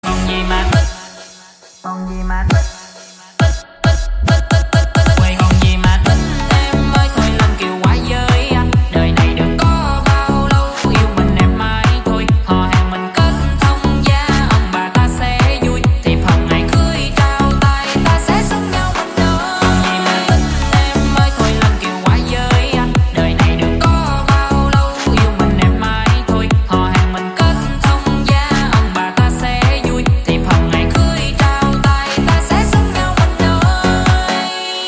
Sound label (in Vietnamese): nhac chuong remix